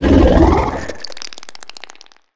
Alien Passying by, Monster Sounds; TheDeclineOfWarriors; Monster Sounds Volume II

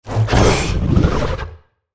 Digital Creature Fast, Monster Sounds; The Decline Of Warriors; Monster Sounds Volume II

